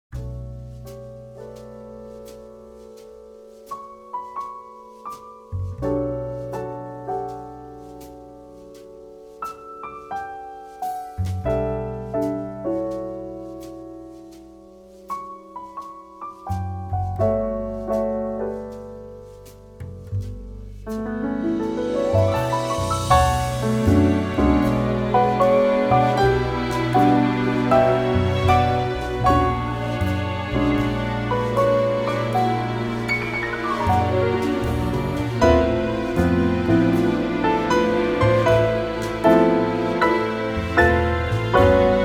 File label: Being Romantic